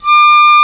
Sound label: Piccolo mf D4; Digital Sound Factory Virtuoso